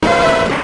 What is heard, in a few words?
cry